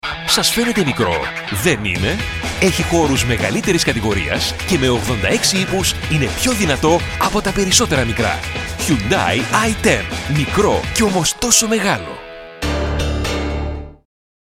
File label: Corporate Light